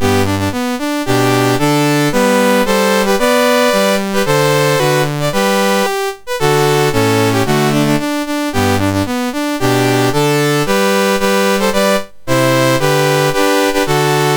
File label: MOS6581R4, National Anthems; Lebanon